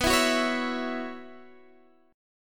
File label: B Suspended 2nd Suspended 4th, Guitar, Standard; Bsus2sus4 strummed; JGuitar